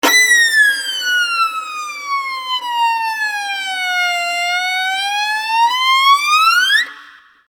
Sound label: ringtone, violin ringtones